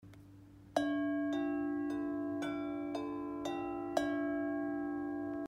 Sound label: Harmony Bell D4 D5; Orange